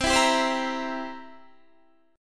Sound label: C 7th C down, C7 chord; strummed